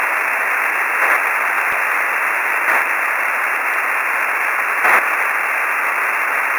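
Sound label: UWE, satellite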